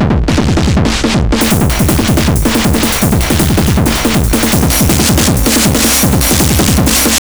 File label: TH 909 160, Hard & Loud Techno; USB Soundscan vol.01; AKAI